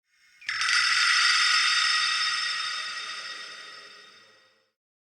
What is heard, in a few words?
Clan Dojo High Bell; Warframe; Dojo Randoms; Ambience